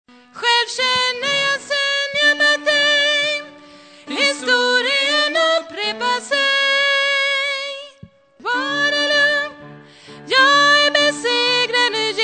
tenor, stämmor

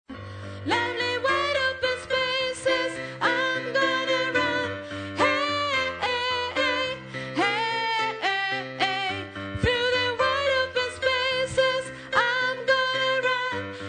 bas, stämmor

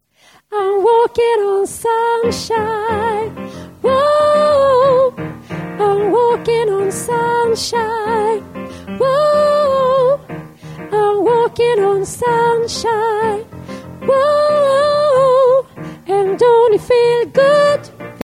tenor, stämmor

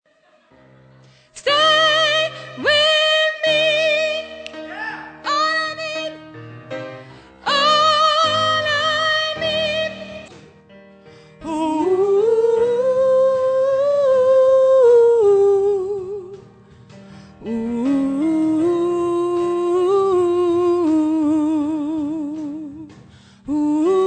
stay with me sopran, stammor

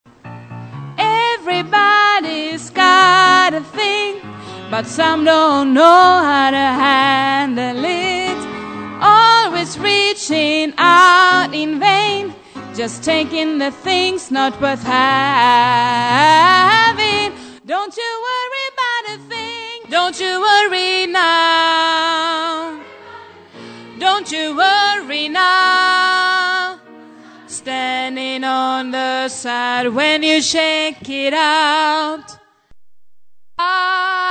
dont you worry alt

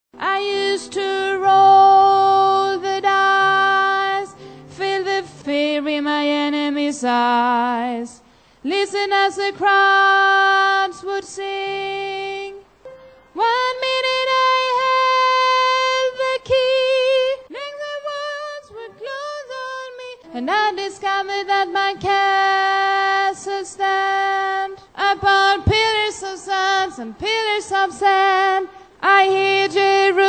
alt, stammor

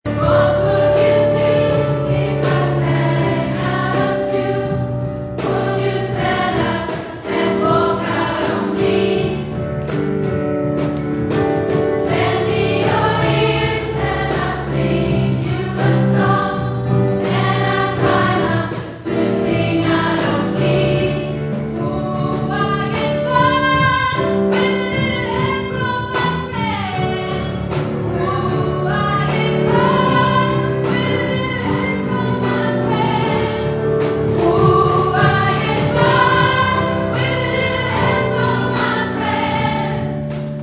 sopran, stammor

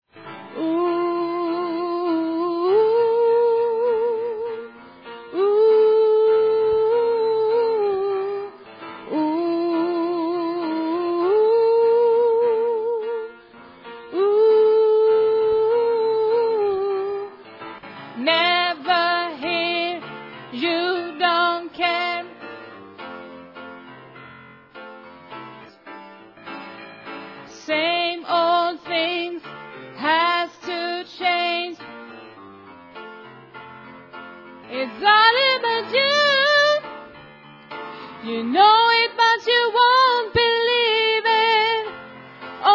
its all about you bas

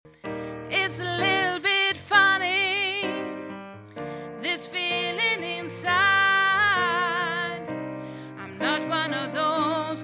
sopran, stämmor

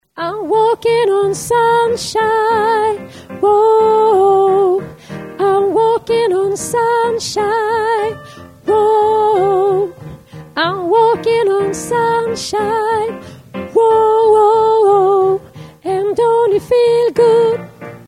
bas, stämmor

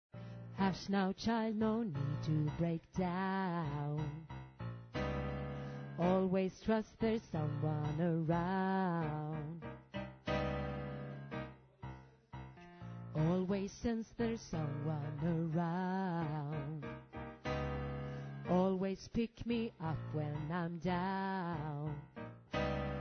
sopran, stämmor

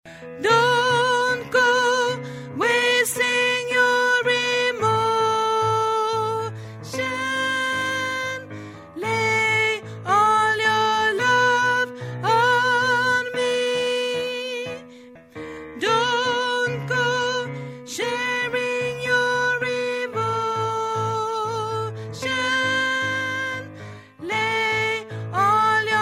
bas

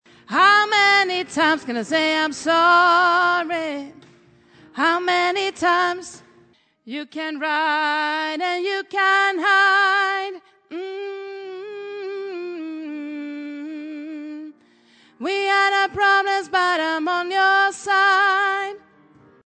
alt

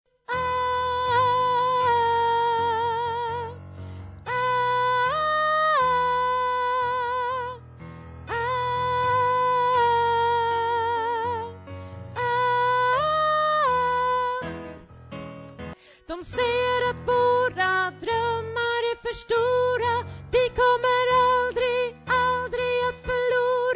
vi kommer aldrig att förlora tenor, stämmor

